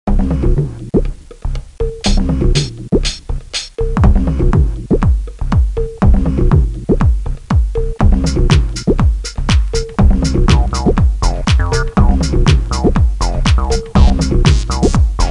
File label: loading song; infantry online splash